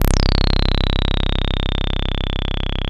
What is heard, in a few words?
SAWREZO, BASSES; Masterbits - Vintage Voltage; VV BASSES